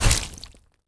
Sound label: Mad Slug atk